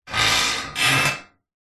industrial bolt thread rub scrape on metal resonate